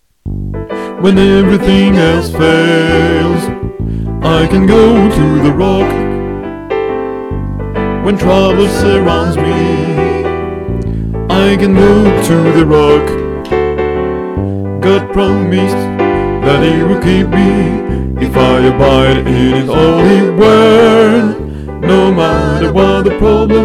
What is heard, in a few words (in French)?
Basses